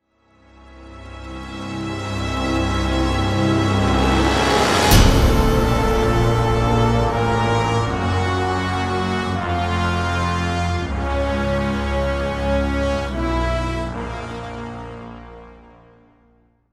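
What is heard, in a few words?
sting, swell brass theme; transformers war for cybertron gamerip; MEGATRONSPOWER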